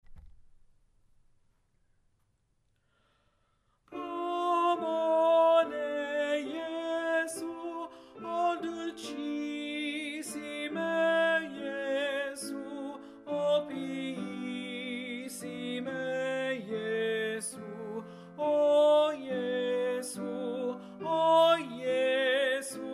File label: Tenor Alto; O Bone Jesu, M. Grancini